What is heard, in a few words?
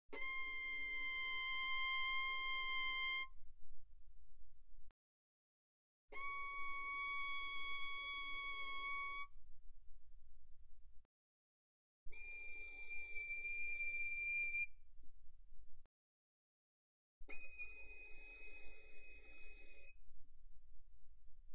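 Violin arco fortissimo sul E C6 B6; Strings; violin2012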